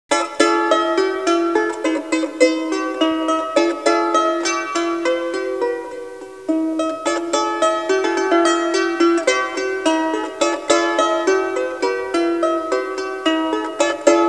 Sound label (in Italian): musica strumentale